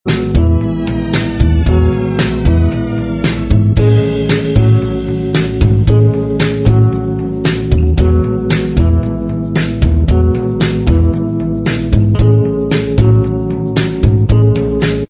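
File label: Inst Track, instrumental track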